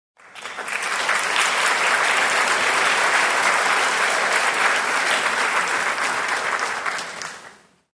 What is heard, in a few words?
audience clapping